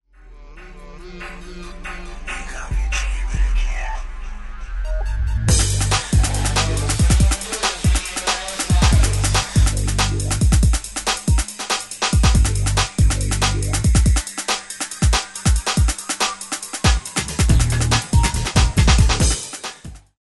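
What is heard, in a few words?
ska mix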